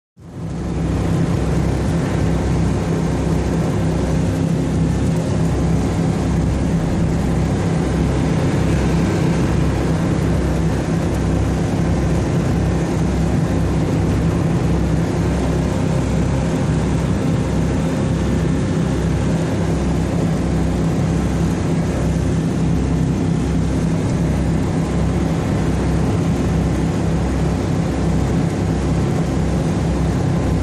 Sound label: Power Boat Int Racin, off shore power boat